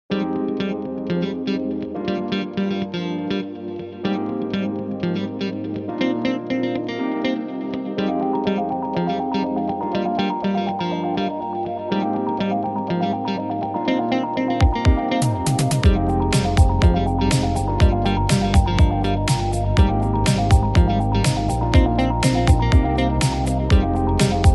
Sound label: rock pop; Background Music